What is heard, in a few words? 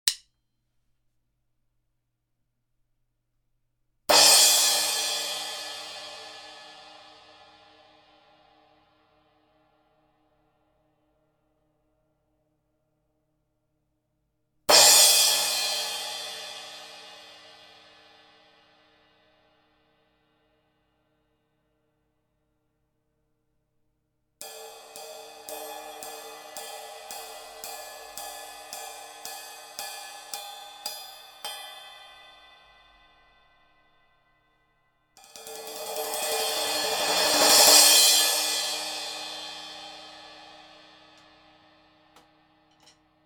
Paiste Signature Full Crash 14, cymbal